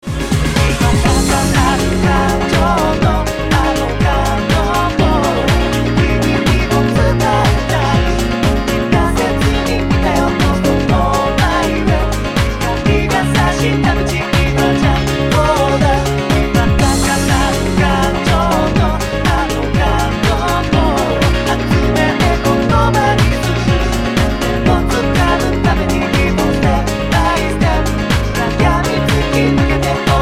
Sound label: dance